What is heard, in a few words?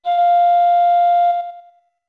Notes Flute F long; OOT